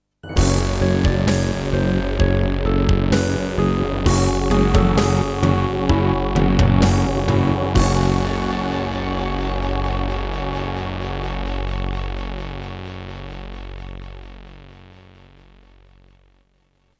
Introduction, Hizon DB333; Doom (Sigil)

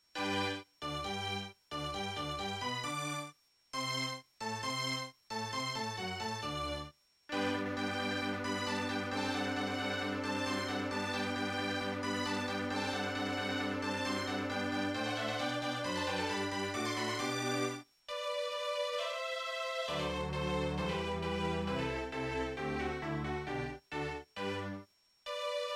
Labway LWHA111800 (AdMos QS700); classical; Mozart; Eine Kleine Nachtmusik partial

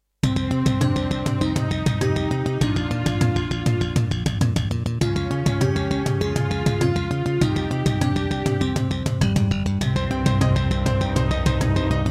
Roland SC-88 Pro; Hocus Pocus

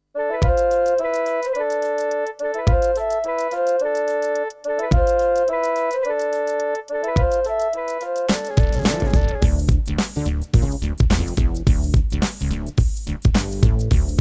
Yamaha DB51XG GSMODE

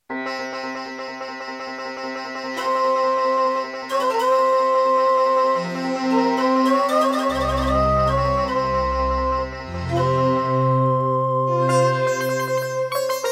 Roland JV-1010; Prince of Persia 2 - Intro

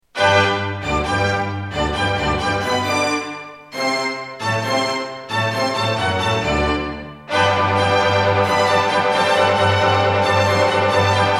Akai SG01k; classical; Mozart; Eine Kleine Nachtmusik partial

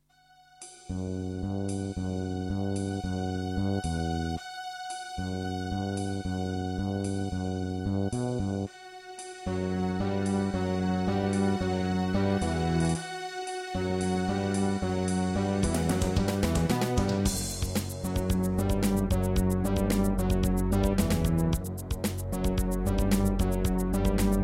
Terratec WaveSystem SCW001-2; Duke Nukem 3D

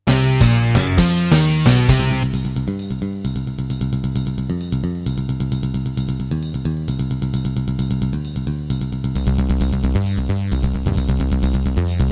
Terratec Wave XTable; sigil doom episode 5

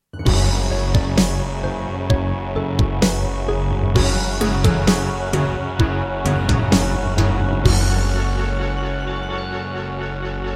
Miditemp DS-48 CleanWave32; sigil doom episode 5

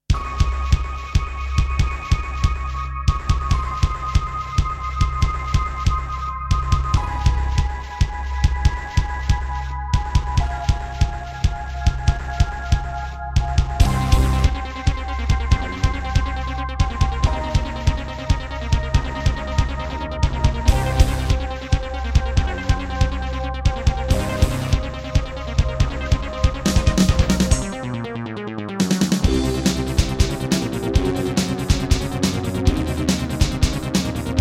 Akai SG01k; sigil doom episode 5

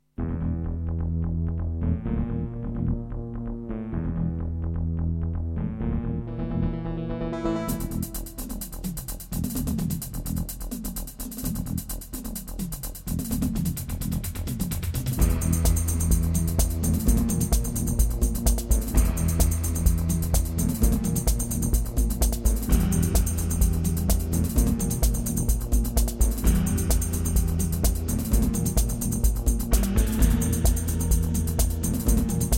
EMU SoundEngine; Descent

